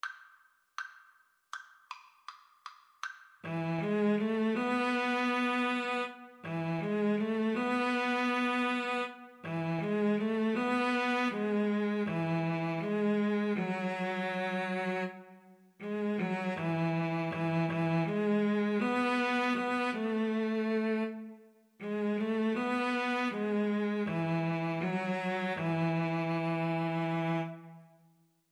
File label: Cello Duet